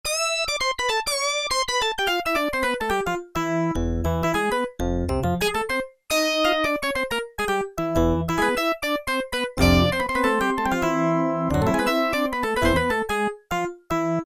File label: CC LEAD, Casio VZ-10M; Internal factory combi